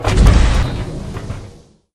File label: door heavy close; svencoop; rngremake